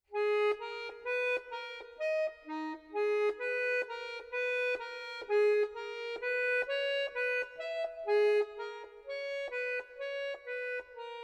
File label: Fugue in G sharp minor, accordion